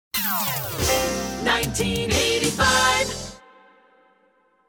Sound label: Hits, Drops